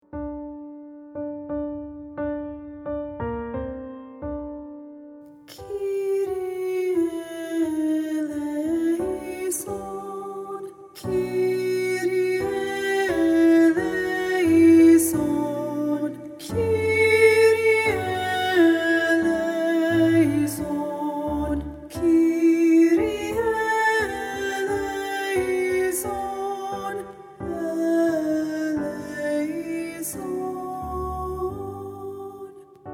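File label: Alto Predominant, SATB Honor Choir; Kyrie Audition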